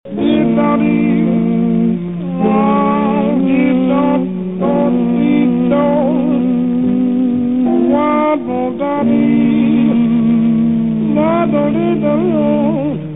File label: blues melody